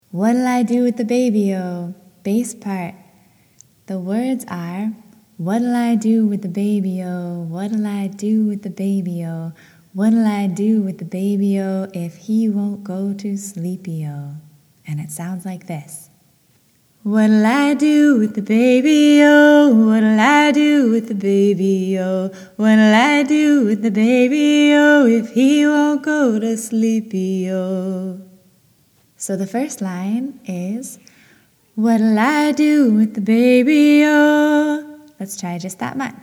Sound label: bass